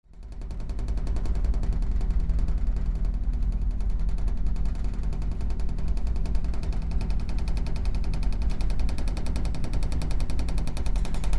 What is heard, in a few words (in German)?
gittertor scheppern